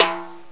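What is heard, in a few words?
high timbale; gmplay; drums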